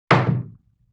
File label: Wood Hit; Vintage Cartoon; Drums & Percussion